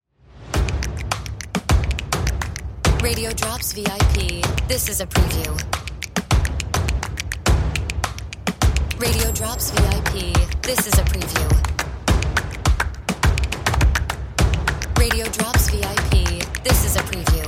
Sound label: percussion